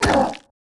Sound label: Knocktaur Damaged